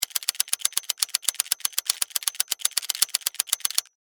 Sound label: Steampunk Robot, Scifi, Steampunk, Robot, Mechanical, Spider; Science, Fiction; BlastWave